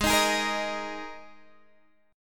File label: G sharp Suspended 2nd Guitar DADGAD chord, down